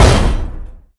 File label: weapon impact light